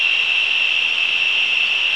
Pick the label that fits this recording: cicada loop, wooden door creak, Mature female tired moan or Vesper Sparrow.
cicada loop